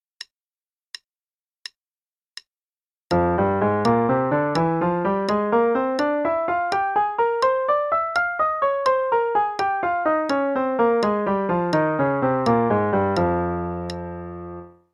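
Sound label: f melodic minor, 3 octaves (qn=84); CM Level 6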